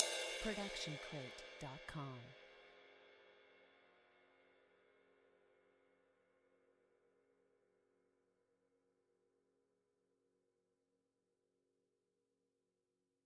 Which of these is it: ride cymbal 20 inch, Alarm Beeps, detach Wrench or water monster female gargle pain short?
ride cymbal 20 inch